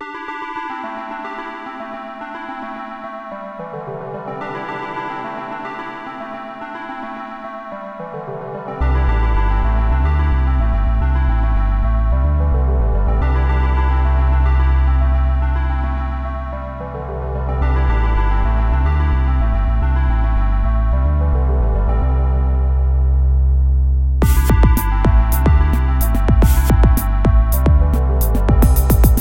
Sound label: electric piano